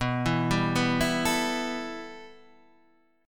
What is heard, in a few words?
B 7th Suspended 4th Sharp 5th